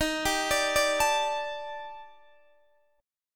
D sharp Major Sharp 11th D sharp Guitar Open D, chord; x,18,17,20,17,19; chordshape